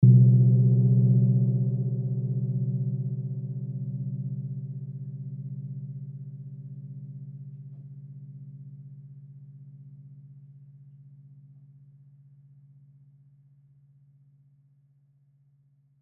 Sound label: Gong Small Low, Gong Small Low Hit Soft; Musical; Blastwave